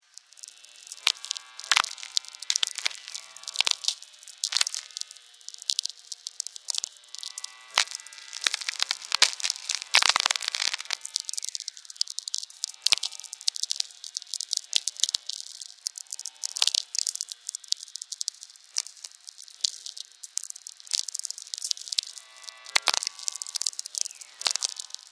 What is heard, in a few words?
echoes